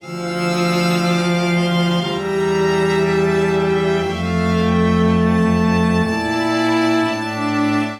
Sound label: Phrase Mournful D Minor 120 bpm, String Quartet; MIDI Clips; Ableton; Factory Packs; Spitfire Audio